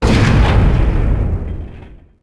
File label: body land; weapons; galak